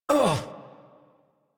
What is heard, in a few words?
Pain, Adult; Warframe; New War; Adult Operator